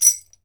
BEL RATTL, African Percussion; E-MU Producer Series Vol. 7 – Old World Instruments

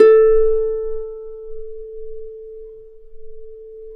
A3 HARP; USB Soundscan vol.62; AKAI

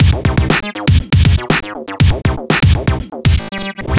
Disco Punk, Beats; Sonic Foundry (Sony Creative Software)

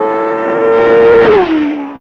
Race Car, Fairlight CMI IIe Machines

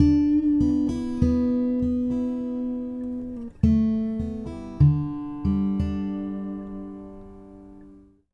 Blue Trees Gtr, Guitar Only; Sonic Foundry (Sony Creative Software); Friendly Fire

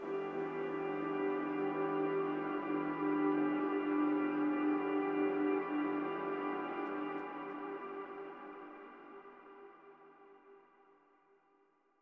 shimmer piano pad; ambient or nature

